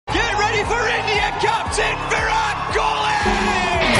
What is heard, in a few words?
tamil song; virat kohli